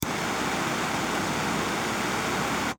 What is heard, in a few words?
Industry Blow Torch